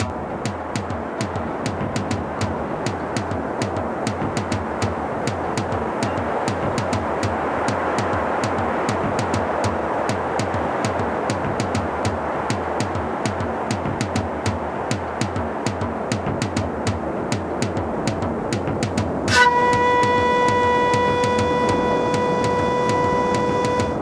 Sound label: Japanese Sci Fi overworld